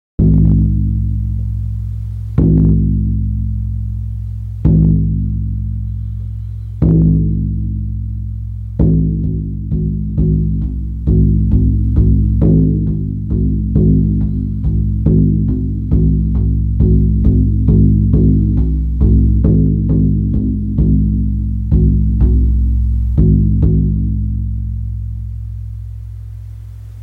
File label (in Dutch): geit, sjamanendrums